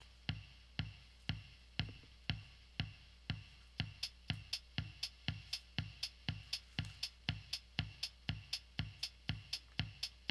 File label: piano mashup; beats